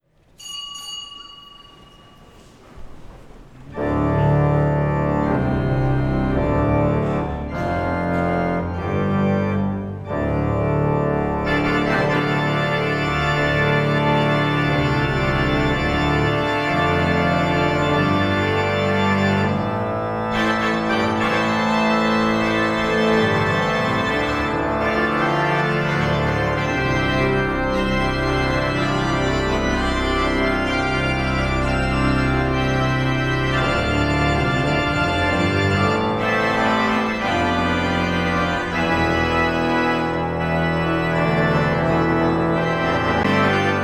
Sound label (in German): Orgeleinspiel; Katholische Gemeinde Salvator in Lichtenrade; Kirchenfuehrung